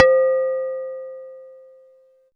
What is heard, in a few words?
Synth Bells; Dream Zone